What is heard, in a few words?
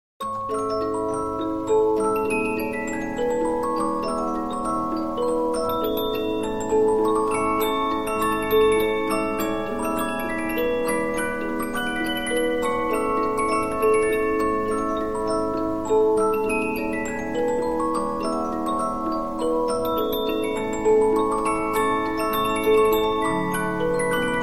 Polyphon, 15inch disc musicbox